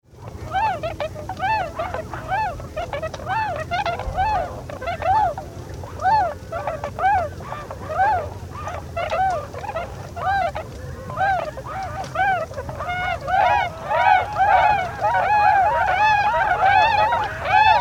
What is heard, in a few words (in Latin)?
Cygnus columbianus Gruppe sukzessives Auffliegen